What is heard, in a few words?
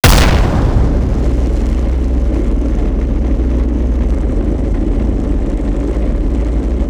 Aircrafts Compressor Stall; DCS